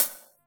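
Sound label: HI HAT, 160 BPM; Ueberschall; Drum & Bass Flash Trackz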